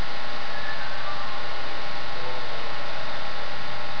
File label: Whistle